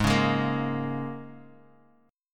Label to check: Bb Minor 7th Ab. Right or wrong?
Right